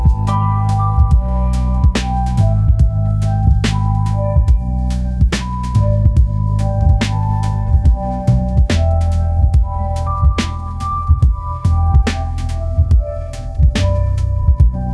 lo fi hip hop, ringtones

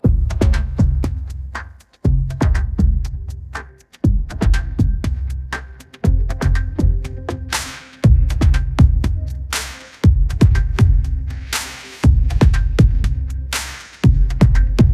upbeat pop melody, ringtones

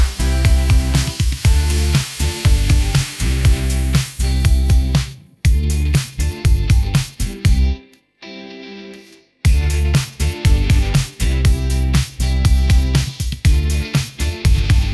upbeat pop melody, ringtones; ai

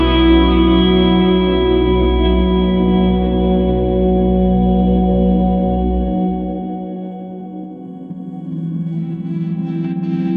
ambient soundscape

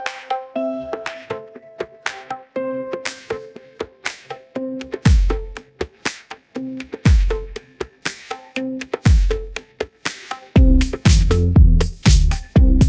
upbeat pop melody; ai

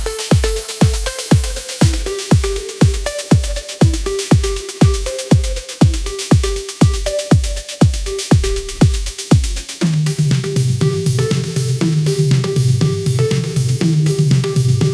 upbeat pop melody, ringtones; ai-generated

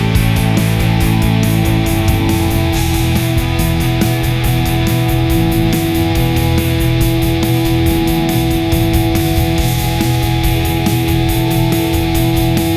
epic drive rock anthem, ringtones

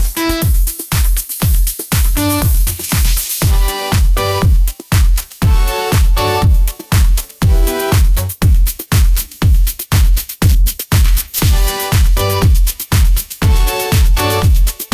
upbeat pop melody, ringtones

